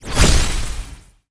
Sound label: ui recorder